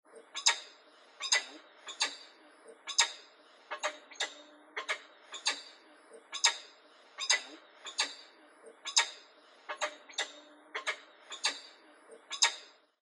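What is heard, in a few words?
Falcon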